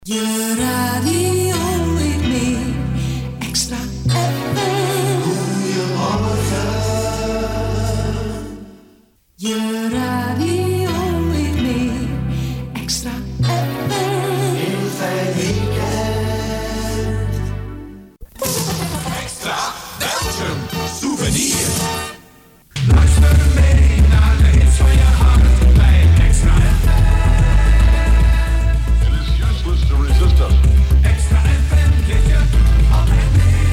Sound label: jingles; XtraFM